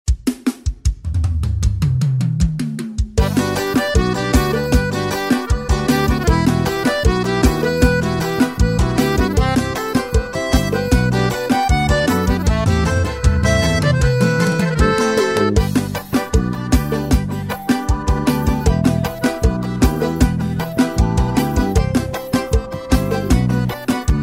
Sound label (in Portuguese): Playback Versao Forro, sax alto; forro cristao sax alto eb